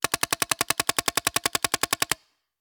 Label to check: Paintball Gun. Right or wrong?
Right